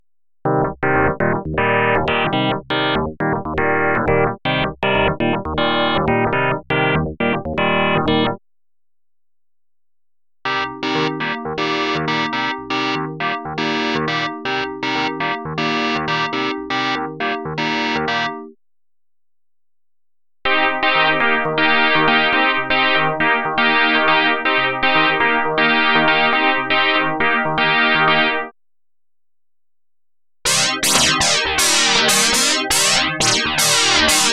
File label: lead clav